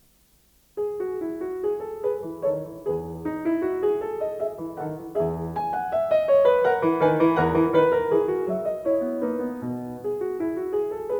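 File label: Menuet, piano; BACH; FRENCH SUITE#6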